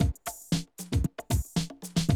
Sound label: Classic Drum Loops